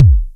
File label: Factory, SDSV Factory Kick; Simmons Drums